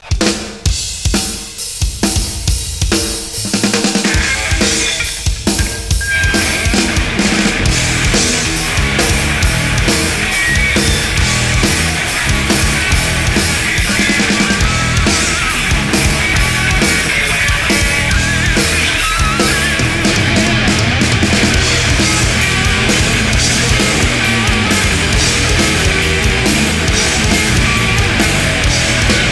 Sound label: Funky Noise (Live), Thrash & Groove